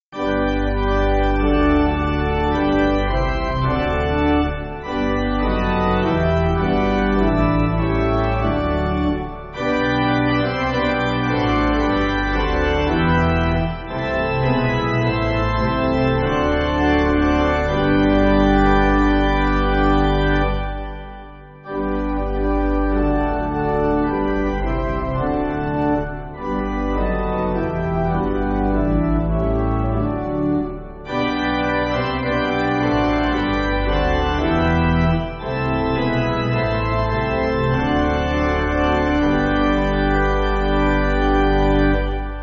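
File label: Pipe Organ, church backing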